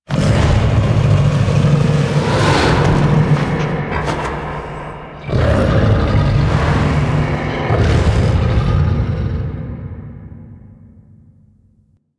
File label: Idle Noble Akimbo; Warframe; Voruna; Idles Noble